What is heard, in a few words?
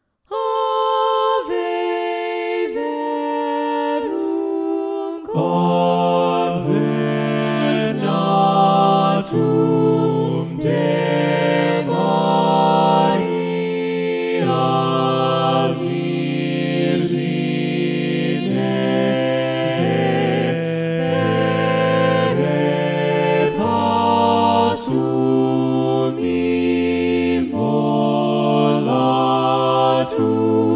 Ave verum corpus SATB Balanced Voices; Robert Lucas Pearsall